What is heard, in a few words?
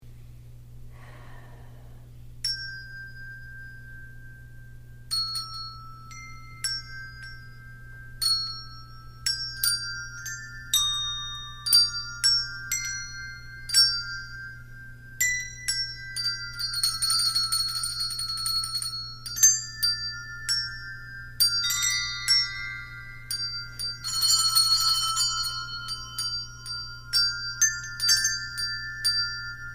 Improvised Bells